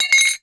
Metal Clang

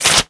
Paper Tear

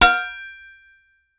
Metal Ting

